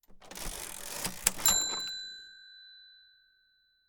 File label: Open